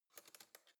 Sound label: cutlery spoon drawer grab soft